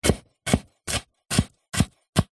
food carrot slices dice cut chop slow with knife on wooden chopping board; zapsplat